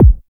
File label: DB KICK, TECHNO; Drums In Your FACE!